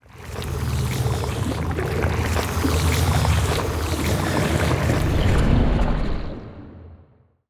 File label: ropy tissue grow